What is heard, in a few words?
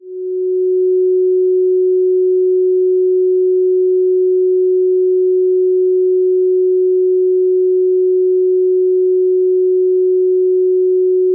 369 Hz Pure Frequency